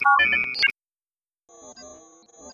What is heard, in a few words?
open Interface